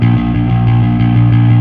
Fuzz A sharp 5

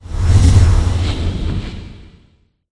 long swoosh; Shadows of the Damned

